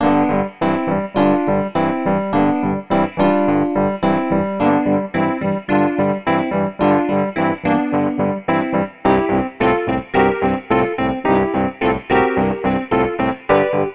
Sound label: Piano Phrase